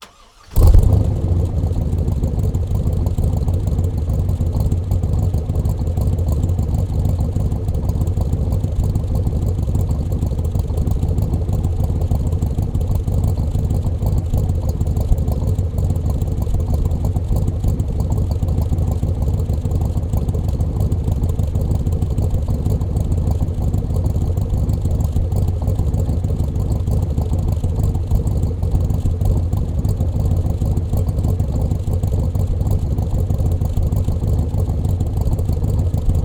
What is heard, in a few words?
Corvette, 1967, exterior, driver pipe, engine, crank, idle, cut, windows down, hood down; gutteral; Eiravaein Sound; BigBlock; rumbl